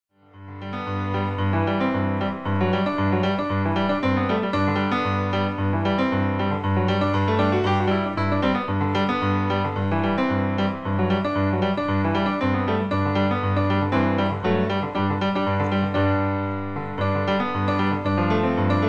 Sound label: piano pick